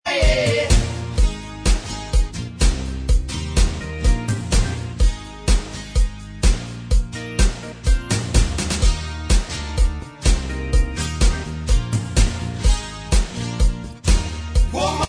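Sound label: backing vocals Track, backing tracks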